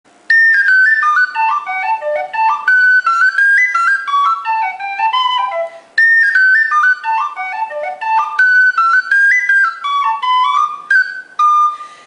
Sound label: tin whistle